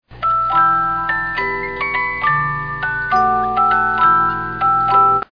Greensleeves, 50-Note Movements

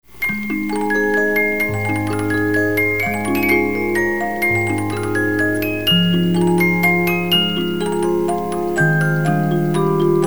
Waltz, 72 Note Movements

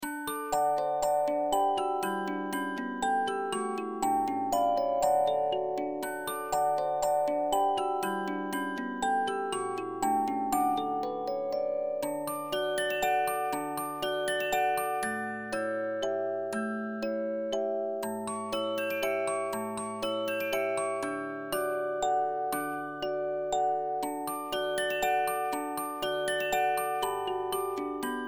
Mama and Papa Waltz Simulation, 72 Note music box

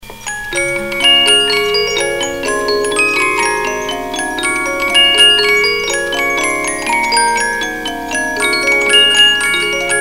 Trout, 50-Note Movements

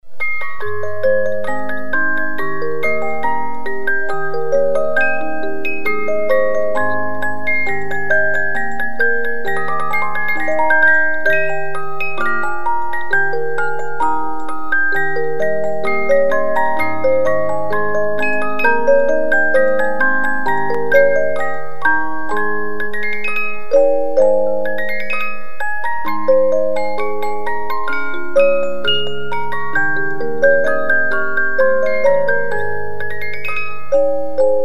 The Magic Flute Vogelfanger, 50 Note music box

